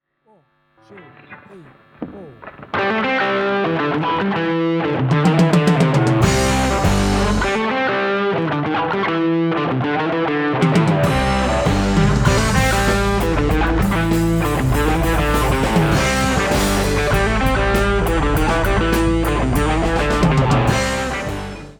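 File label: Rock Guitar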